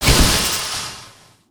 Geiser Burst Small

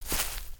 Footstep Grass; creatures pets

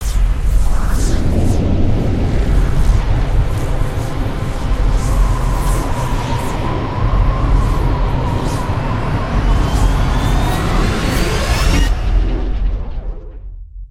video ambience rise

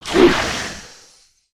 baby spider attack; creatures mechanical

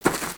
Footstep; Lego; Strombie; creatures organic

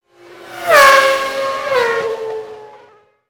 Car Passby Pitch Up

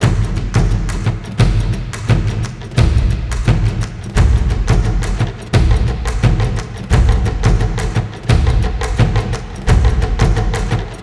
field drums; music; ninjago siege

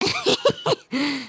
fart

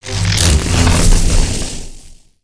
Javelin Charge

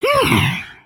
idle, creatures organic

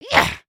Attack, creatures organic

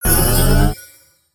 Heal Armor; Kight-samurai

